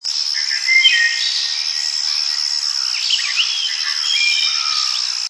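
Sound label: Wood Thrush song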